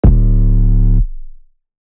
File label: 808's